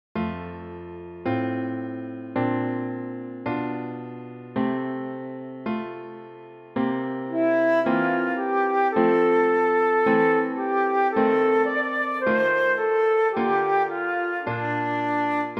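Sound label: Danny Boy, Querflöte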